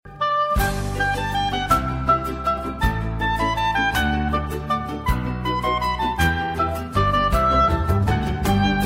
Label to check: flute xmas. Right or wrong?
Right